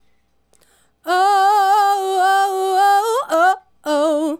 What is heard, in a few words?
Female Solo, Hip-Hop and RnB Vocals